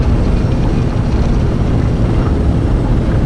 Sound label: thrust, vehicles